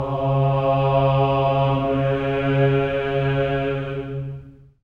amen; Spectrasonics; Symphony of Voices; GR AMENS

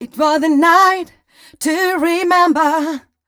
f mel e flat, fem Vocals

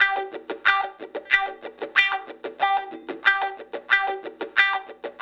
Call And Response Guitar, 70s Analog Funk

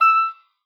E6, OBOE; Xsample VOL-6

